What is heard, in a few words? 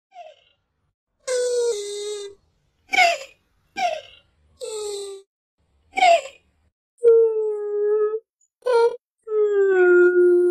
TORTOISE SOUND TORTOISE SOUND EFFECT SOUND OF TORTOISE NOISE OF TORTOISE